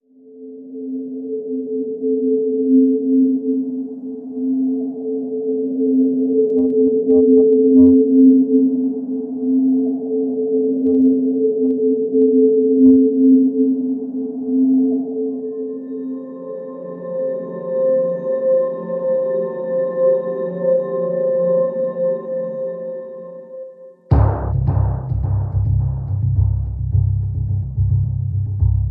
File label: Pads and Pulses